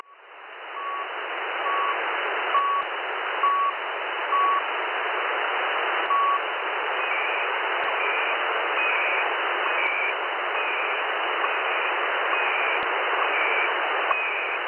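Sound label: Time Signal Station Time Signal AM; 7850,0; CAN; CHU; Ottawa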